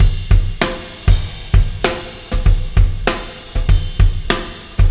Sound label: Landing Party Beat, Processed Drumkits